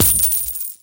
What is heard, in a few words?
collect; Burning Reels; wazdan